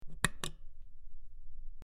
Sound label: Button Press In Out Sp, switch clicks